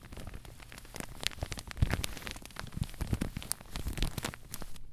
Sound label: Crumple Thick Plastic; BB Retail Therapy Sample Pack